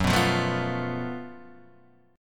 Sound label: F sharp Minor Major 7th Double Flat 5th, Guitar, DADGAD; chordshape; down